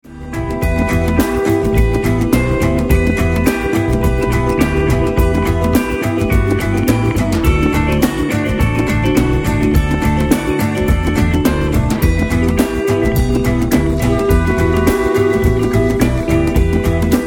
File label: French Pop To Funk